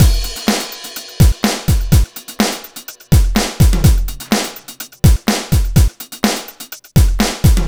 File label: Drums, Drum Loops 125bpm, Nu Dance Electro; Garage Band